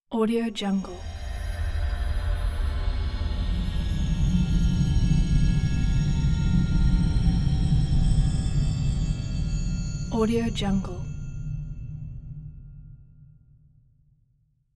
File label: scifi musical accent; hollywoodedge